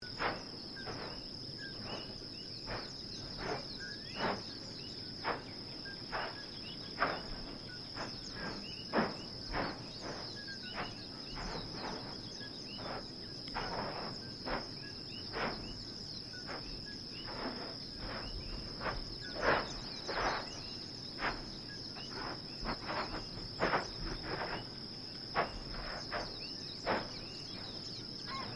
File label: Volcano Birds, Hawaii's Kilauea Volcano